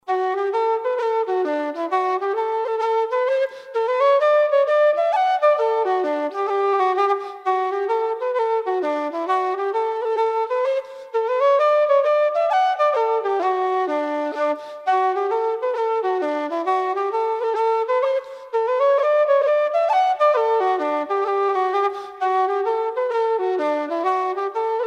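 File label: Hornpipe, Traditional Irish Music; Delahuntys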